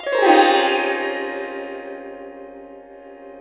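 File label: sitar glissando; Spectrasonics; Heart of Asia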